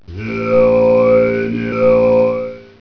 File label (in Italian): tibetani